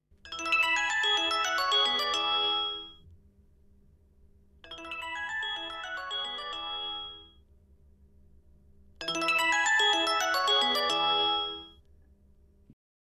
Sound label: Bells, Olofonia